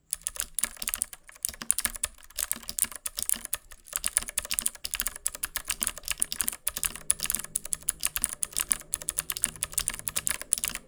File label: cherry blue, mechanical keyboards